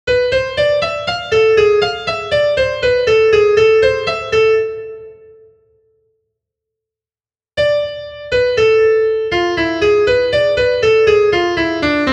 マイナーツーファイブワン

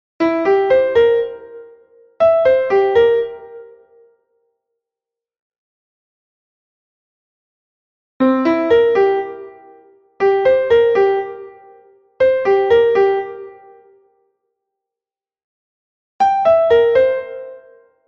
7th 基本フレーズ

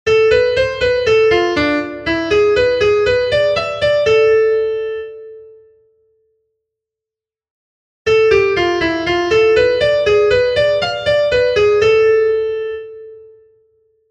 コード、スケールを意識したマイナーツーファイブワン

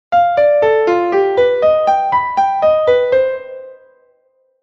オーギュメントコードを使ったツーファイブワン

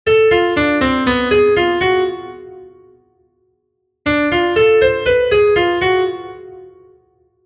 ファイブのアイディア

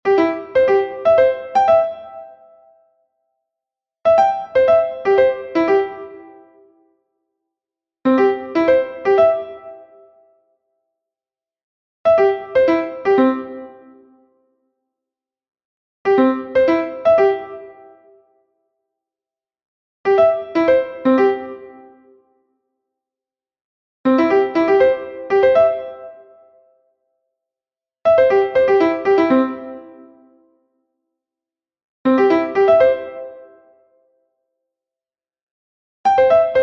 major アルペジオ

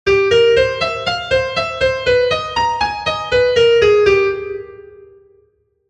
オルタード を もちいた ツーファイブワン

